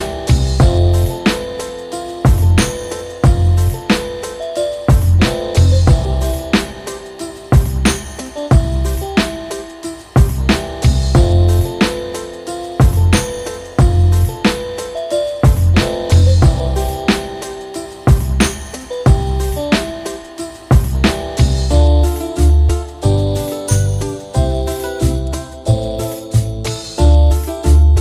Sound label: Lofi